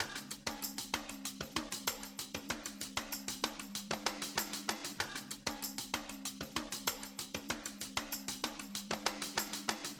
Percussion, modern hip hop

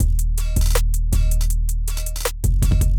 Drums Without Percussion, hyperpop beat

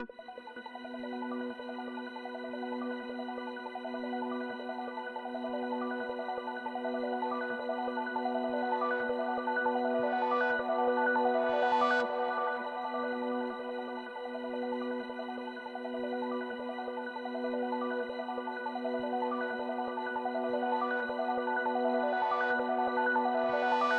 Synth Drone, shoegaze

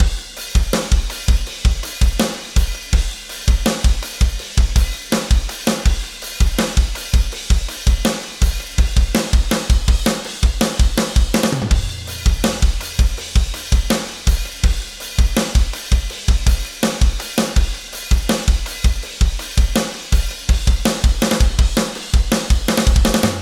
Chorus Drums, modern metalcore

